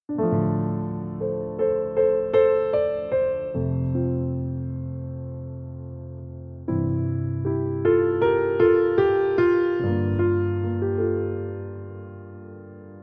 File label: (Piano Version)